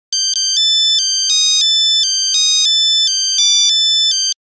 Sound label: Nokia Buzzer Languor; Windows Phone apps